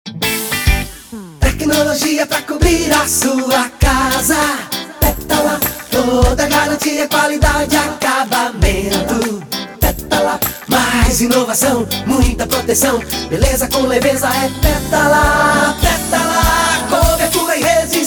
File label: Jingle; Pétala